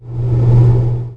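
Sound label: machine; quake2; mnhcoop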